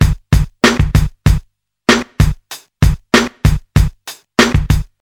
96 bpm rap drum beat A key, kick tuned to the A note